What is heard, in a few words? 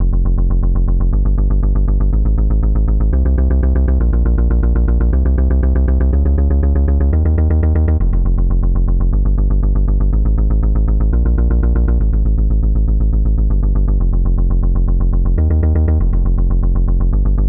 Pluck Bass; super crush k.o.